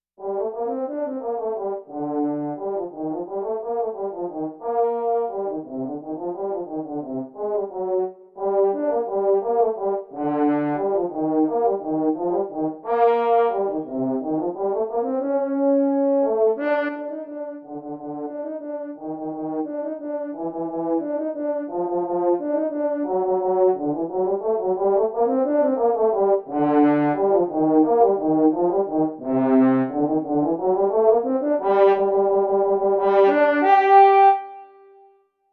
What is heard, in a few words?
F Horn Technical